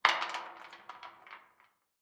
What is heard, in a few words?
impact stones throw in metal storm water pipe